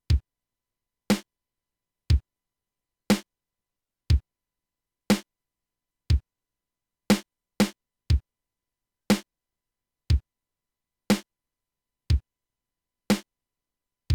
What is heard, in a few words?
Slow drums